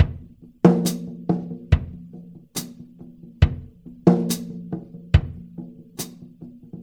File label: Earth Loop, Drum Tools, Laid Back